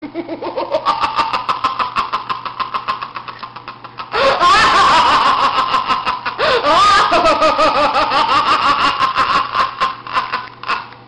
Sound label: granada knife; cs16; cstrike; QuakeSounds